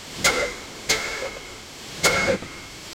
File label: heavy breathing inside, oxygen